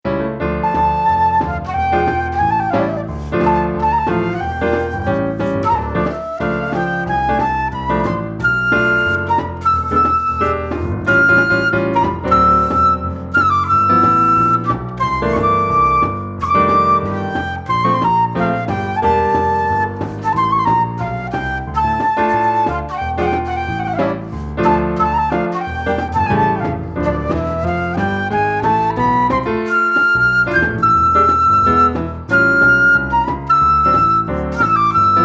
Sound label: jazz swing